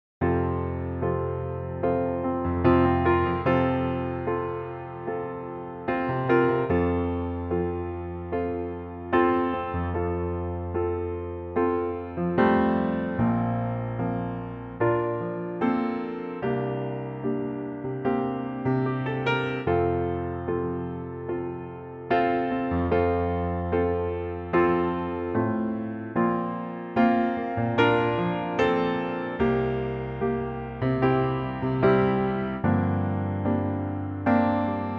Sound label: F piano; key - F; Pianotrak; Fantastic piano only arrangement